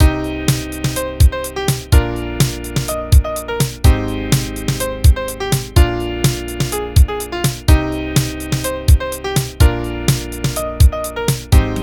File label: Sound Way Midi